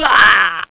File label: grunt; Gloom3 rejects